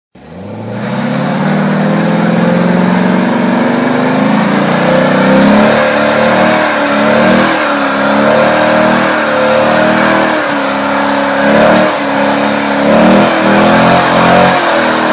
Corvette Burn Out

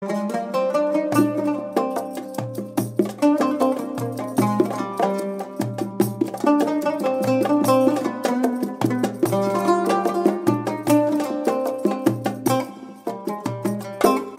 Arab ringtone

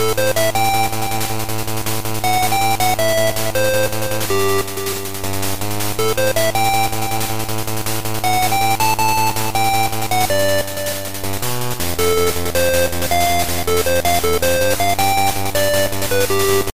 gameboy; solar

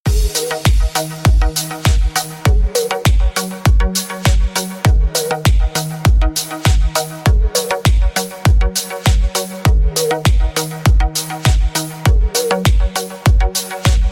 Music

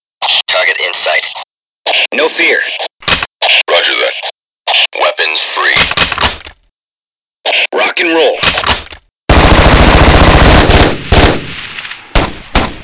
Rock N Roll

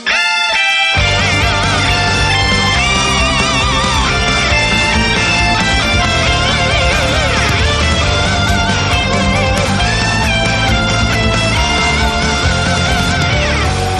Marionette Looped

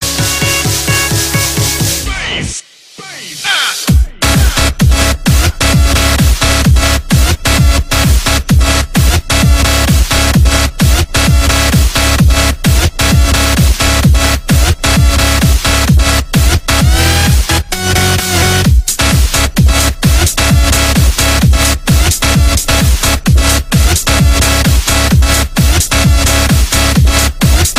Free Step Techno

